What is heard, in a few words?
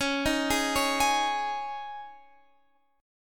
Db Suspended 2nd, Guitar, Half Step Down